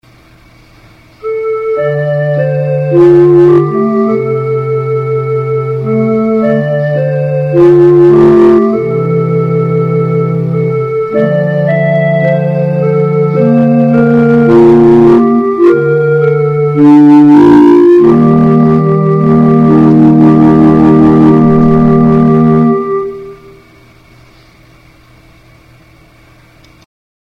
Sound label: flute; seicento